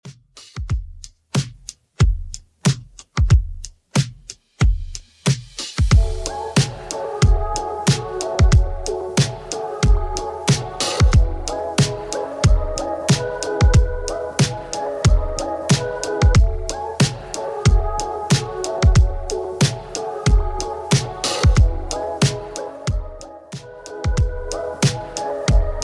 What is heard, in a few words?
Top40 Redrum 92bpm